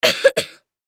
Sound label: Human Cough; Cough Male Six Yrs Boy; Human Cough Male Six Year Old Boy; Sick; Sound Effects:Human